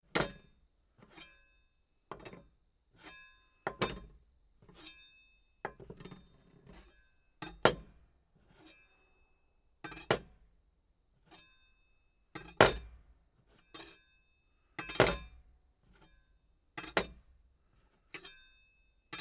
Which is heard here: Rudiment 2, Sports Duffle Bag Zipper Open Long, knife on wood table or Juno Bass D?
knife on wood table